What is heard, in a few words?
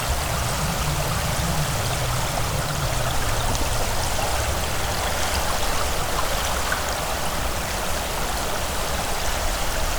waterfall earth; Titan